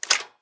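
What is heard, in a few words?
weapon plasma rifle reload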